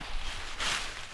Rustling Foilage

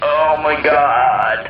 Kidney flange

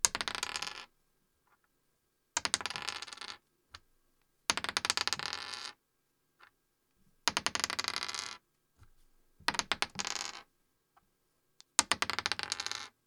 Dice Throws

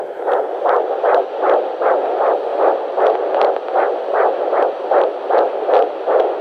Fetus Baby Heartbeat

